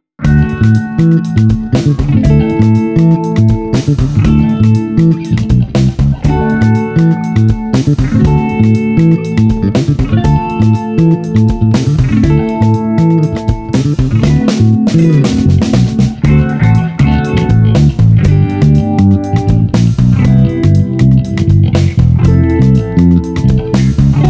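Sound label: Street Combo Bouncy Jazz Funk, 120 bpm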